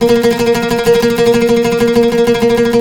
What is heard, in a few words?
TREMOLO, Turkish Oud